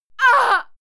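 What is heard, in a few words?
female fight pain, screams; schreie; menschen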